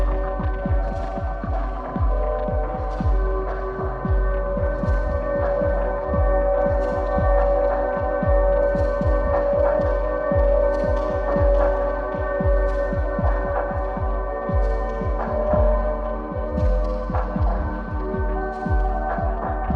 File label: ambient version